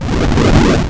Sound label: Boom